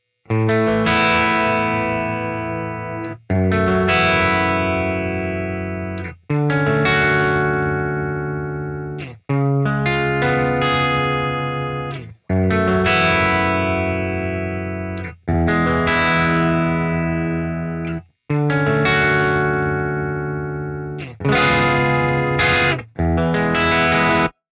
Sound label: guitar off